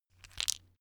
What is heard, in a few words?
stone gravel clatter short; natur-tiere; steine